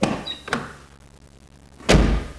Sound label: door; transpo